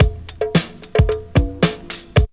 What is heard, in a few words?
break; Zero G - Man Machine